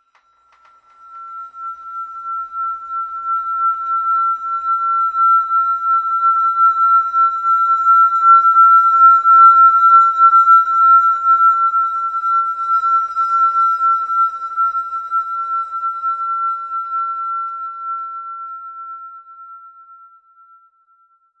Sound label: glass bell rubbing; Bells